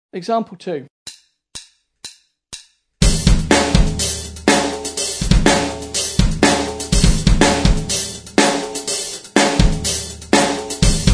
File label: drum set